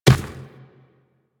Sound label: Inf Medium Run Dirt, Infested Footsteps; Warframe